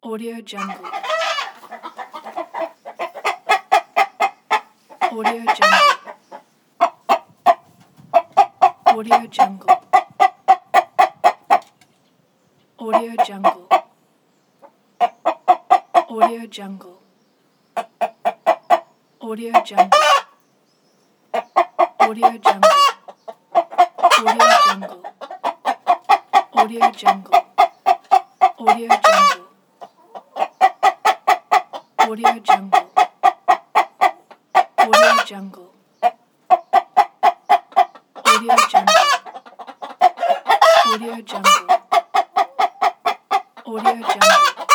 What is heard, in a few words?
rooster clucking; zoomlight